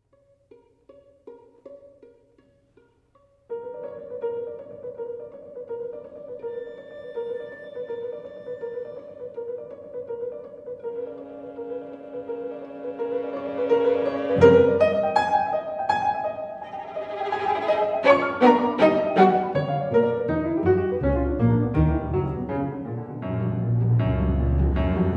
Suite Comique For Flute, Piano and Strings - Mvt. 4. Finale